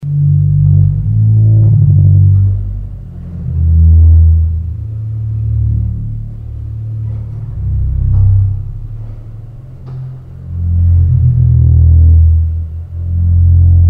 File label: Principal 16; urkutieto